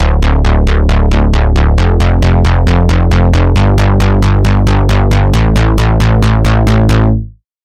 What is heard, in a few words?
Bass; Tech Vibe; Routed Sound; Vital Expansion - Reality Maker Vol.1